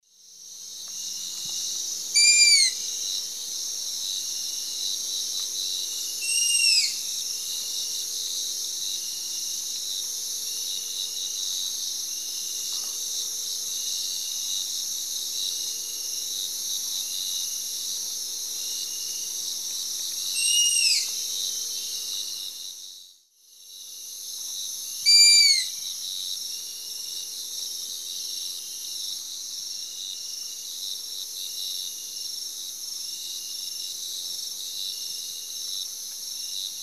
Asio clamator, calls pair; S. America; Eastern Lowlands

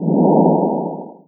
Laser FX; Dance; SFX

